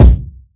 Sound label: bass drum one shot a sharp key; kick drum samples